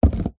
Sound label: cardboard shoebox empty set down; zapsplat